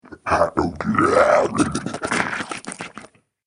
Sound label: Orc Savage Shaman, monster; Monster Sound Effects; Andrew Fleming; Twistedtracks